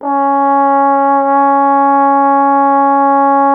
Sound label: Trombone C#